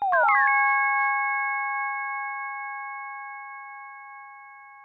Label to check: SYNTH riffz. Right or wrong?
Right